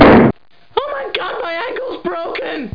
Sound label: GO DOWN